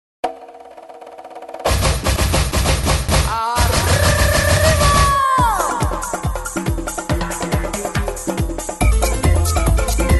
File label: Samba Carnaval